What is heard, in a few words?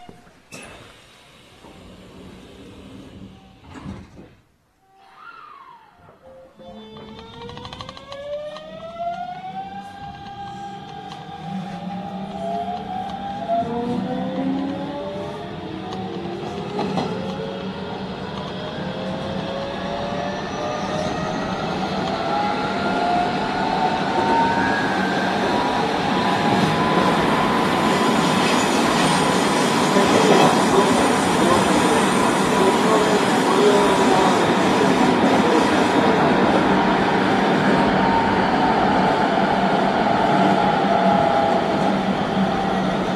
vvvf, mitsubishi; hansin 5611